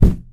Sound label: cardboard box impact soft; physics; Garry's Mod; hl2